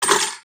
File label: keys, wood metal; wood metal SFX